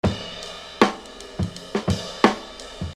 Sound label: Beat, Hip-Hop Street Beats; Construction Kit; Sonic Foundry (Sony Creative Software)